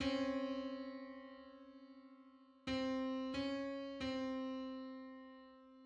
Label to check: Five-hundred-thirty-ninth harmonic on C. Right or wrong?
Right